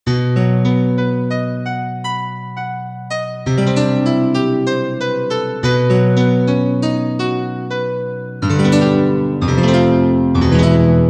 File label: Simpl Piano, Korg MSC-1S; Korg MSC-1S Variety